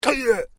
MALE VOCALIZATION EEGH; Male vocal sounds; Exclamations; Voice

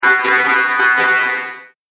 Strange Resonant Hits; Miscellaneous Impact; Andrew Fleming

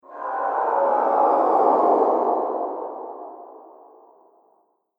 Slow Astral Drop, sci fi; Sci Fi Sound Effects

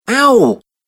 Male Voice Ow; Recorded Voice Sound Effects

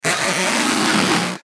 Balloon Rub Squeak, Balloon Rub Squeak Rubber; Foley; Balloons; Cartoons; Blastwave

